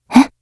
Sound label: m4a1 jump